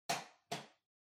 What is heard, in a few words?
Washer Front Load Noise Far Unlock